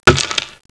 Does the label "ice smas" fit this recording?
Yes